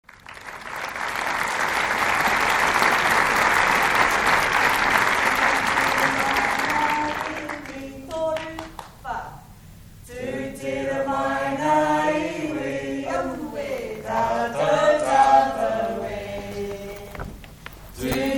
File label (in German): gesang